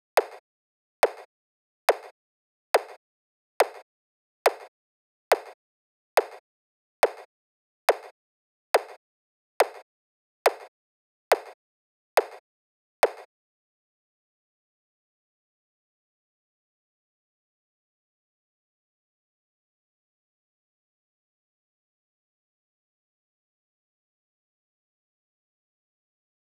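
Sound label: click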